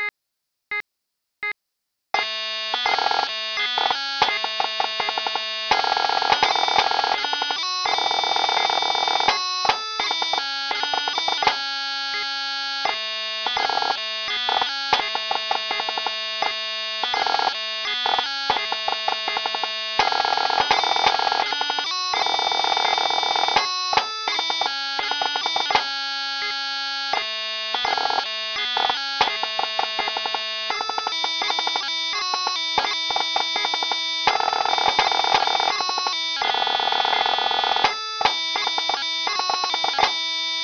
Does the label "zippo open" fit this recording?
No